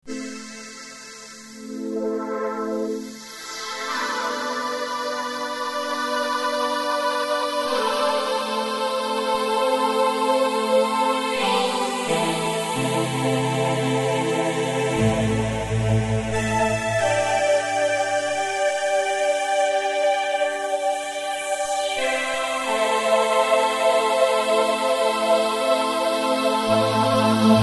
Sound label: Low High Manual Sweeps